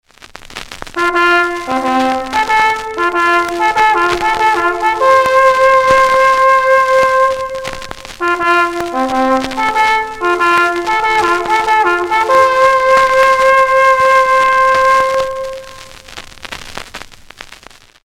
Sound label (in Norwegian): oppstilling, leirsignal